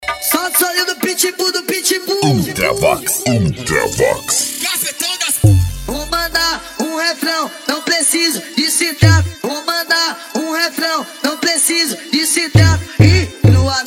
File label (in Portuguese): ritimada agressiva